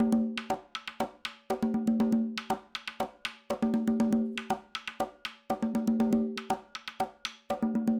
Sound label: Conga, acoustic percussion loop; Drums & Percussion; Percussion Loops